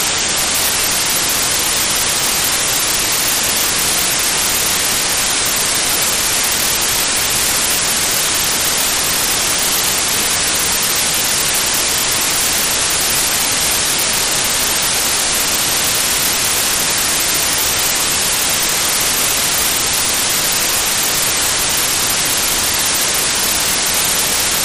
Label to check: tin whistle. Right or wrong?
Wrong